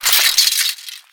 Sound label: armored knight wielding a sword